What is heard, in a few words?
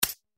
click; クリック04 クリック。